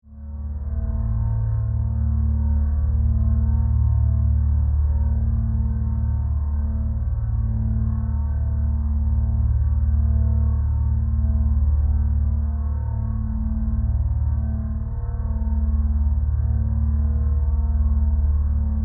Drone Energy Source, Scifi, Drone, Energy, Source, Flutter, Science, Fiction